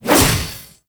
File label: Whoosh; Warframe; Weapons; Tenno; Revenant; Nikana; Tatsu Prime